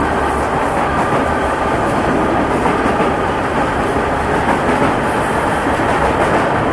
sbahn go

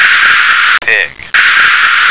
pig

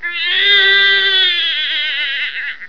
baby cry

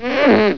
FART; DOOMWADS

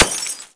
GLASS

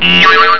BOING

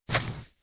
CLOTH

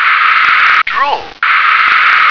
drawer

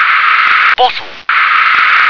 bottle

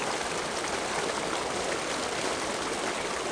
WTR FALL

